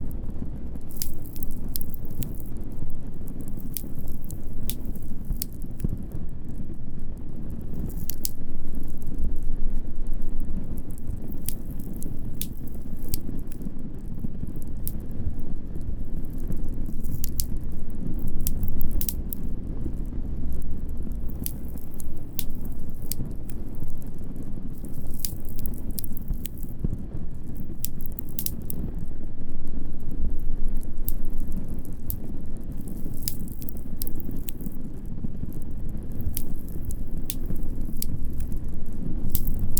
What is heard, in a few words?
open fire, ambient fireplace